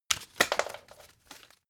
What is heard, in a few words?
playing cards handle drop; comedy-cartoon; spielzeug